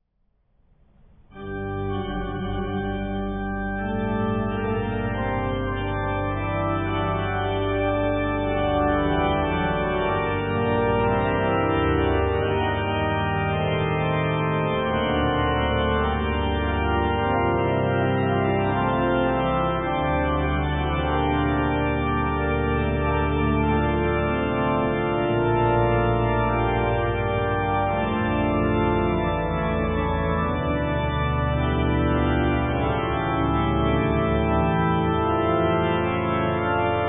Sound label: prelude, organs; sittard st pauls guillain